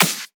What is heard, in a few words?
Claps & Snares